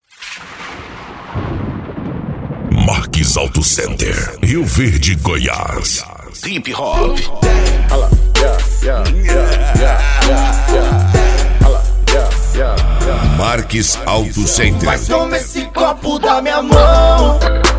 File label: Hip Hop; Open Format; Marques Auto Center